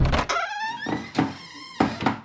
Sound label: book enter